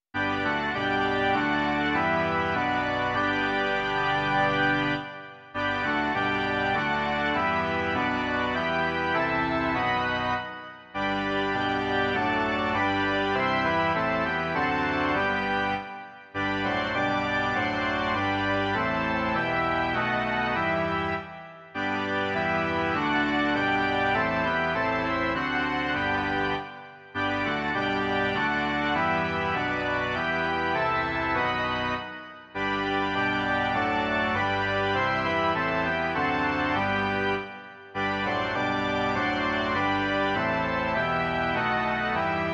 varhany

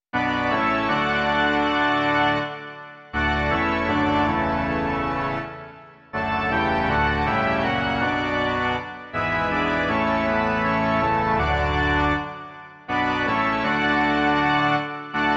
Blahoslavenství v průběhu roku, Ženská verze; Doprovody Farského liturgie

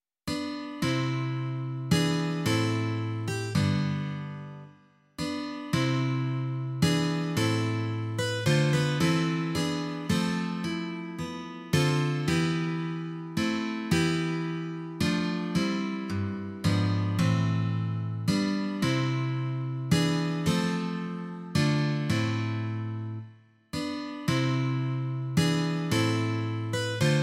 dvanáctistrunná kytara; Syn Boží se nám narodil; Imaginativní verze

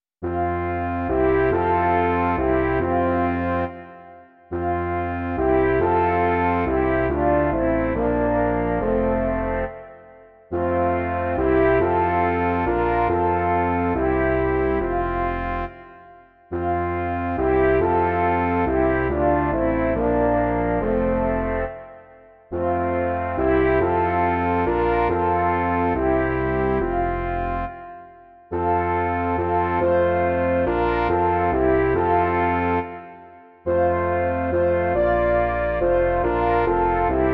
žesťový kvartet; Sláva buď tobě, Bože náš; Imaginativní verze

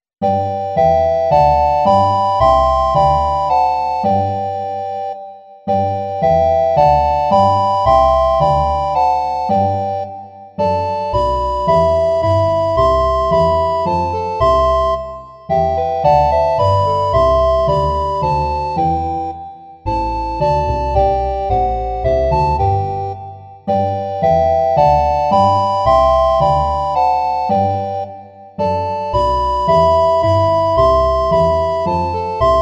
trio zobcových fléten a kontrabas; Hospodine všemohoucí; Imaginativní verze

